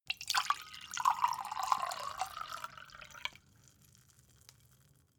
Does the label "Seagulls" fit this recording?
No